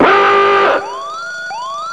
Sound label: Warning Message; Net Power 1999 #12